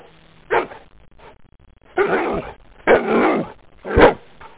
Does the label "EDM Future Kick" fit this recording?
No